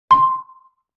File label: Xylophone Single Note C; Vintage Cartoon; Musical Elements